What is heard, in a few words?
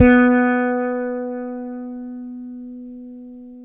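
fretless bass; Spectrasonics; Bass Legends; 6-STR FRETLS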